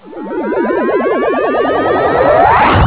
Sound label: spaceship